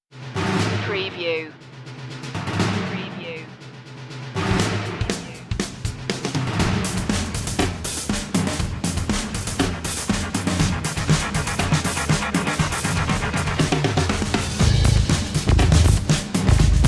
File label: cold steel electro bass